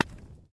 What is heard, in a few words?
crackle, anomaly; Stalker Anomaly